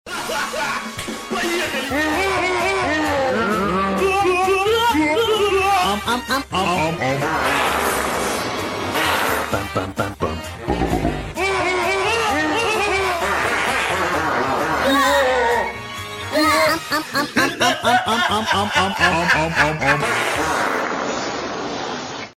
mashup; mellstroy; masha i medved

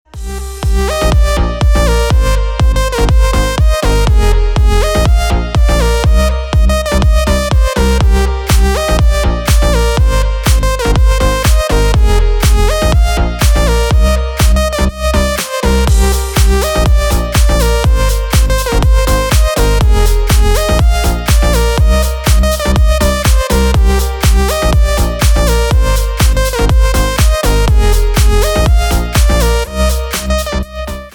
call sound

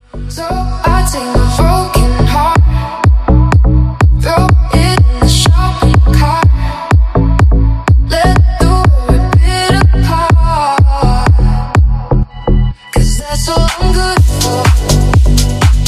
speed garage

